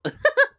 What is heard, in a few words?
Jump; Collector